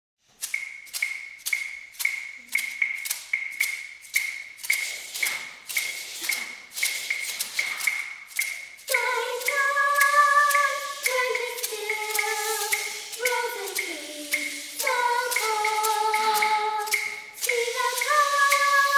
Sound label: giant tree canon short live excerpt